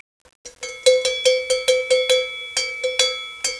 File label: goat